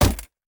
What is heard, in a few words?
Land; Hollow Knight; Hive Knight Land